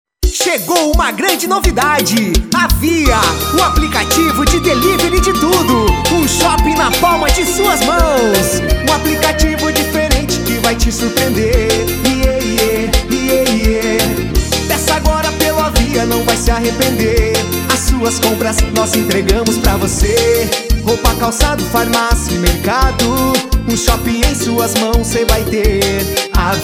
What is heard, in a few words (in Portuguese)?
Jingle Aplicativo; Avia